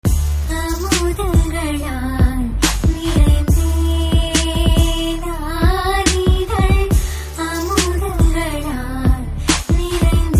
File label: Ringtone